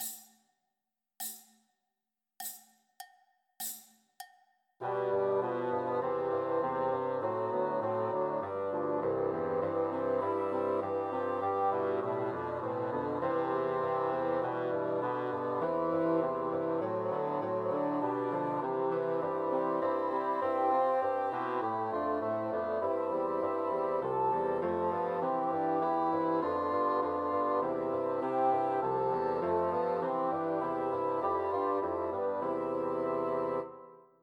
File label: Once in Royal Davids City Christmas Trios for Three Bassoons